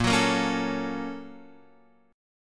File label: A sharp Minor Major 7th Sharp 5th